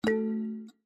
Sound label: ding